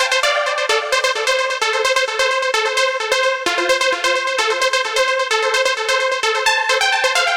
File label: Dancing Synth, electric jazz